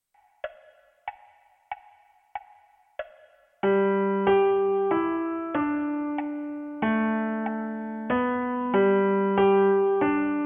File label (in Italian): piano unite